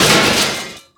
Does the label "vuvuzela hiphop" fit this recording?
No